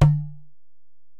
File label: Ac Perc, Drum Machines Alesis DM-PRO